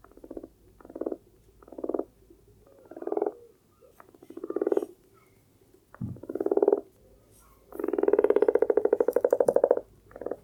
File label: frog; dfcaverns; df ambience